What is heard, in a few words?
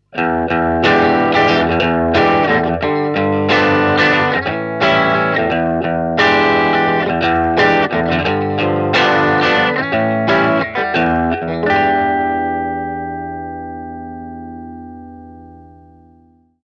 tele voicing5; fender super champ x